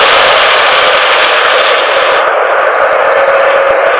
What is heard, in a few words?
grinding